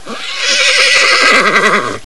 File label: neigh, horse; Fate The Cursed King; MONSTERS